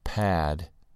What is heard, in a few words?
pad